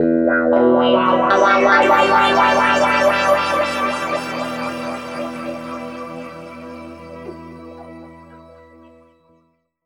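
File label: E pitch shift wah, Guitar Samples; East West; Steve Stevens Guitar Samples Collection; PTCH-SHFT+WA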